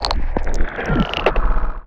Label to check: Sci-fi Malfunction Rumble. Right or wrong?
Right